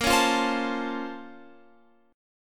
A sharp Minor Major 9th

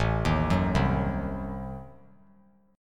A Diminished 7th A down; Adim7 arpeggiated; JGuitar

